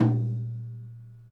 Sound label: Tom Low Vol